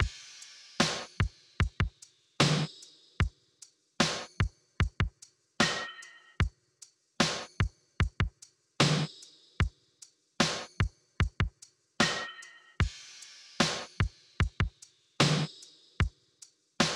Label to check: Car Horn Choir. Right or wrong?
Wrong